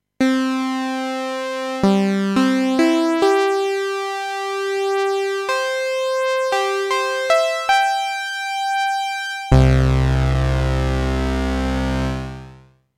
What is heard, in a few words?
Basic Patch